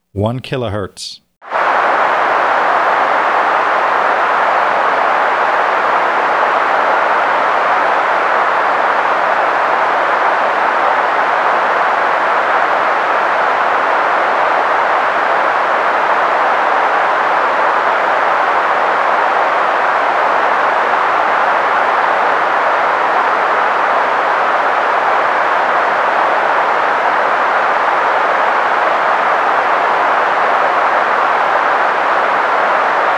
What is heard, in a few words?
pink noise 1khz